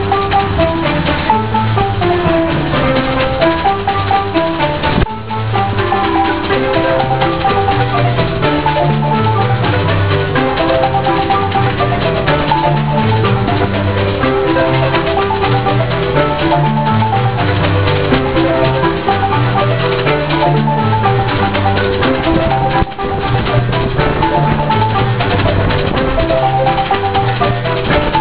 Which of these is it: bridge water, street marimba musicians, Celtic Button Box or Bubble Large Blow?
street marimba musicians